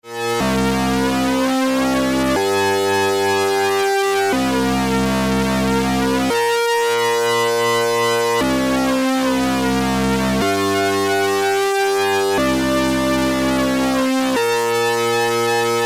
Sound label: Phaser Plate SID off